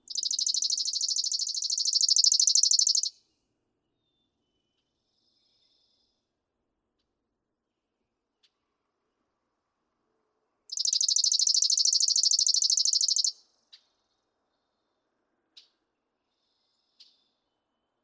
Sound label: Chipping Sparrow